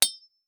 Weapon Sword Hit Concrete; AdobeCC

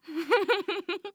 Human Female Giggling

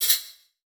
Multimedia Internet CD-Rom Flash Click Multiple

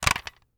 Electronic Plastic Disk Holder Moving; AdobeCC

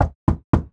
Door Knock Medium; household

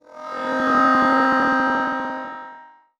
Transition Digital Scanner; Production Element Title Transition

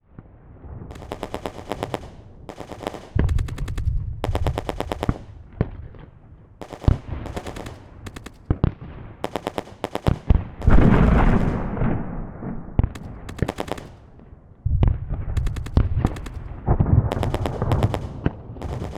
Weapon Ambience World War II Battlefield; AdobeCC

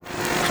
Flash Wipe Electronic; Multimedia Internet CD-Rom Flash

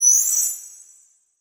Title Transition Crystal Shatter; Production Element Title Transition

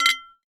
Household Glass Clink Two Glasses

